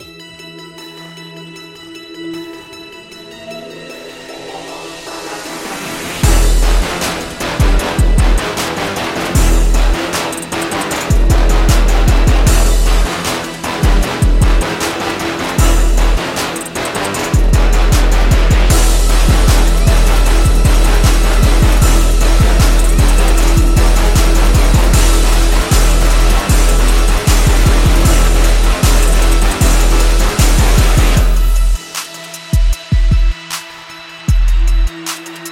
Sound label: A Trap